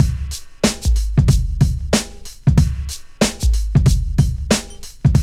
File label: 93 rub; AMG; Now CD-ROM (Roland); BLACKtoBLACK